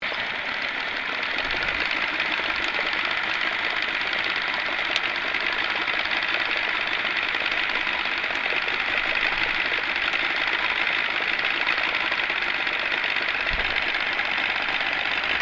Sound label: Boat Motor Outboard Johnson55 Passing Slow Submerged; Various private boats; Boats (Sound Effects:Vehicles)